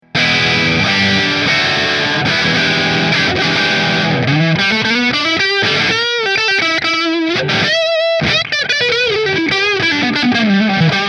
cherry Monaco Neck Marshall; Hamer

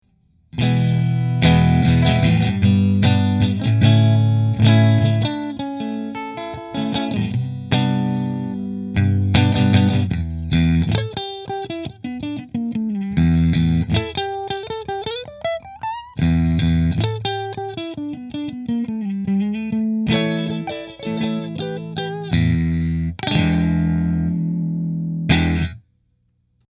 Silverado Position 3 Fender

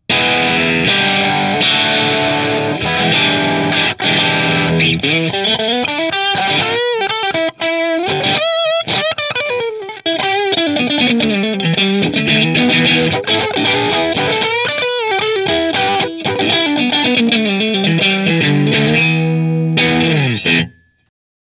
Driftwood Bridge Middle Marshall; Nola JB14

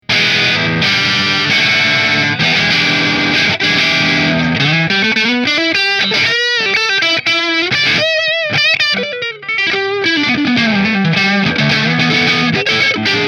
Melancon Neck Mini Position 2 Marshall

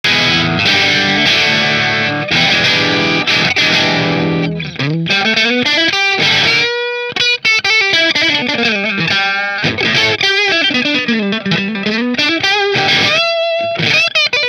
Custom shop strat position 2 marshall, guitars; position 3; Fender Custom Shop Showmaster

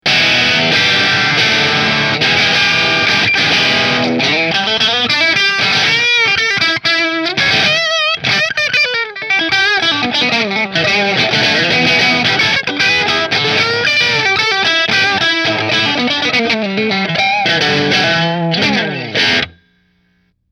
Grosh Strat Position 5 Marshall

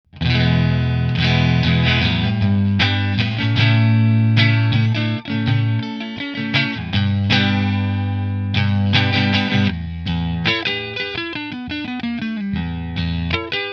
Fender Strat Kinman Position 1 Fender, guitars

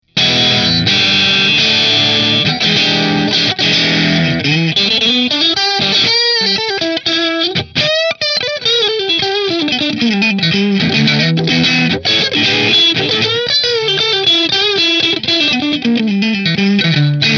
Italia Position 2

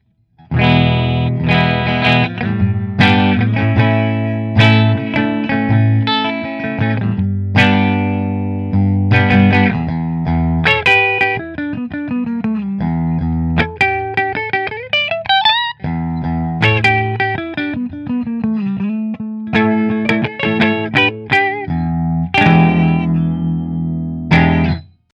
Gibson 335 Middle Fender

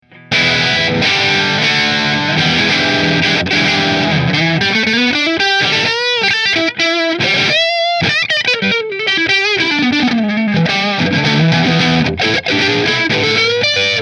Greco Neck Marshall, guitars; Greco Les Paul Custom White

